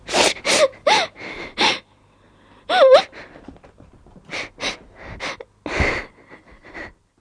Tiếng khóc nấc thút thít

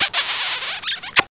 hon nhau nu hon

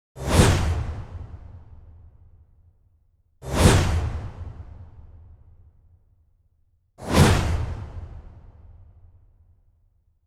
Am thanh bay qua vut

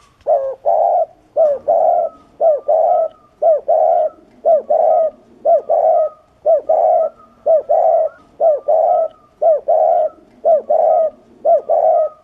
tieng cu gu

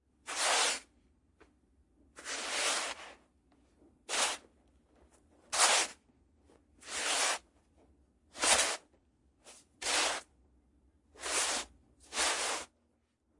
Tieng sot soat khi xuc cat dat da

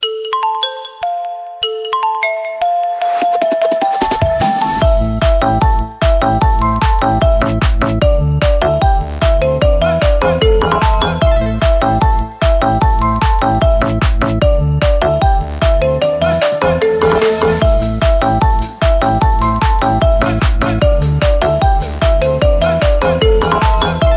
nhac chuong oppo remix; tiktok

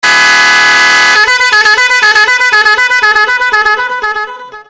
Tiếng còi ngắn

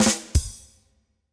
Tieng trong ket thuc

